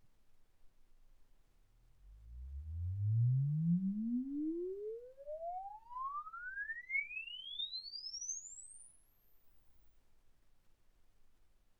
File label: exp swept sinus 10Hz 24kHz